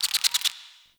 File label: Insect; Alchemy Lab; Warframe; Hub; Liset